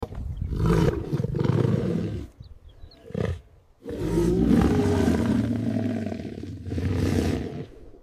lion roar ai cinematic

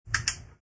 flashlight switch; soundsmith

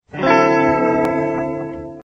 guitar chord; sams chord from clarissa

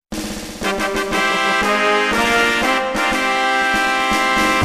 victory fanfare; final fantasy ix; final fantasy win sound

